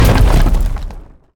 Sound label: ice hit low